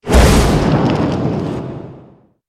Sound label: Flamebreak; Batrider